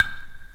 Perc, Percussionz

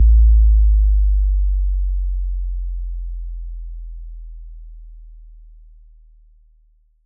SubDrop Longer

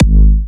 Hitter G#4, Big Room Kicks

